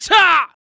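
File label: Mon Man ATTACK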